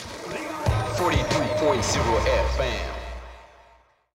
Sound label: Radio Hiphop Jingle; Gangstar Miami Vindication; Android; macOS